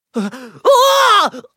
shriek

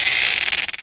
bite

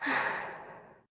sigh

